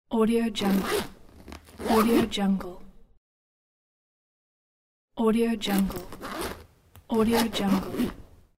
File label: zipper on the bag